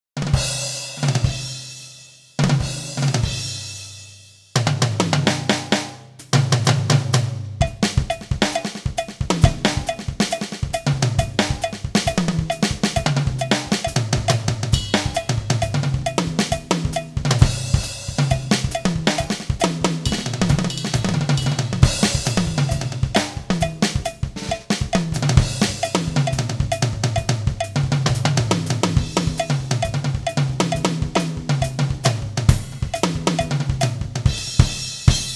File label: perc